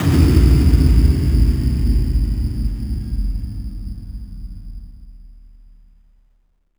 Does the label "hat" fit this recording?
No